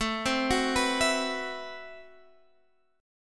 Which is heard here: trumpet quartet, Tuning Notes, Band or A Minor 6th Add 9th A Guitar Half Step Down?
A Minor 6th Add 9th A Guitar Half Step Down